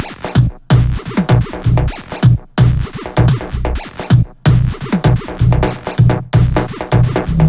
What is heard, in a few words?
128BPM Melody; Vengeance Samples; Jamboo Kit